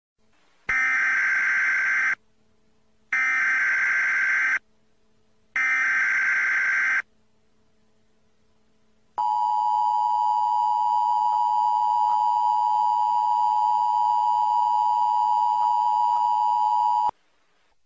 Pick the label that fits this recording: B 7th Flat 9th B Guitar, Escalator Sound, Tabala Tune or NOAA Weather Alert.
NOAA Weather Alert